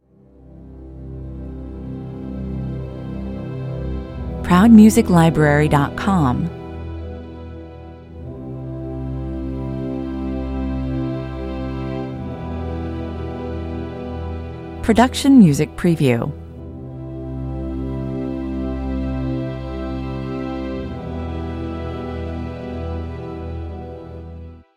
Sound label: (strings only)